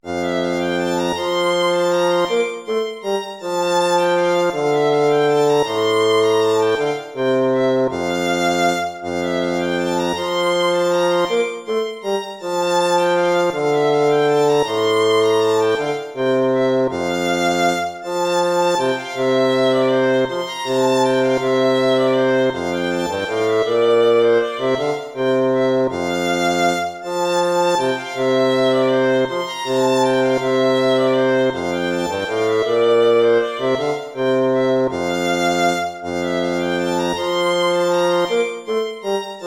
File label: lute